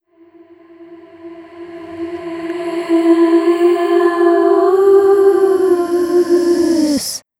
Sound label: Riser Vocal A